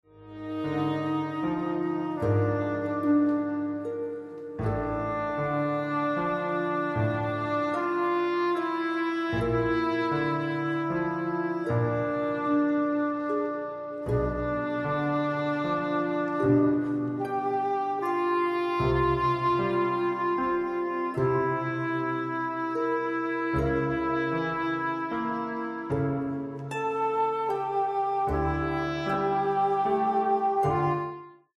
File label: Lacrymosa; CLASSICAL RELAXATION